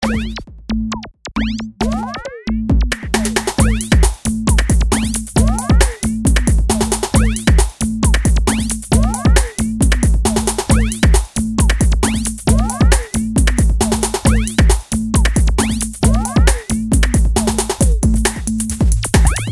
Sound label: bleep mix